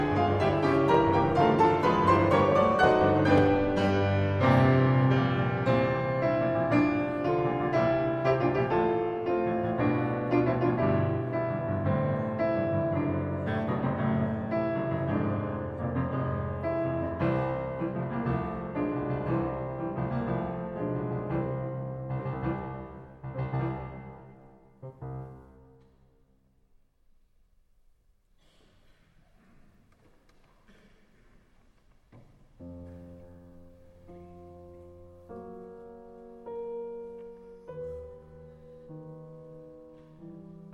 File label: Sonata for 2 Pianos F minor Finale Allegro; Brahms; Op34b